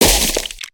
Crates n Boxes, destructibles

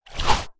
color window open; build mode

